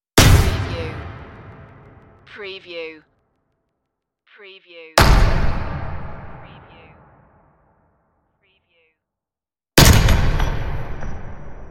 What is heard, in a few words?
heavy impacts